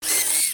Overbuild Fidget; emotes

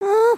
need to pee look; emotes

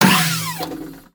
Broombot On Hit, creatures mechanical; Inventor Broombot

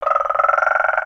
Pets Tortoise Happy; creatures; Lego

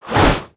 Massive Spear Swing

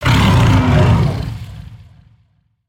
Gorilla Roar

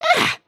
drink; emotes

